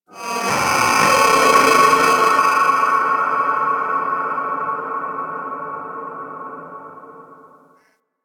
Cephalon Tech Medium, Ambience

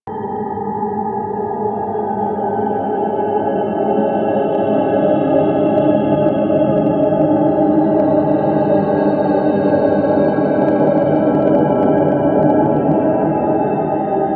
Glassy Hall Amb; Ambience; Interior; Warframe; Orokin

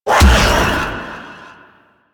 Whirlwind Dash Impact; Warframe; Caliban

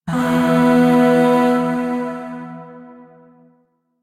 Shawzin Chromatic Chord H; Chords; Warframe; Zariman; Void

